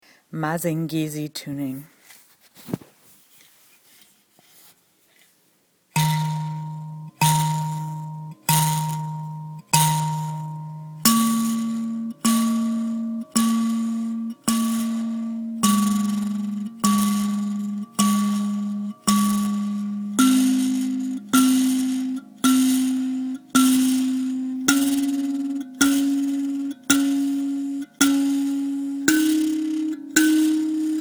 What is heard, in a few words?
Mazingizi tuning, mbira